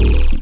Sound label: wobble, Electronic Hits